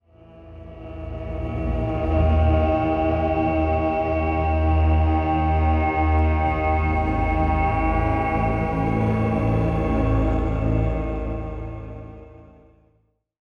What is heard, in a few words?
ethno 125; eJay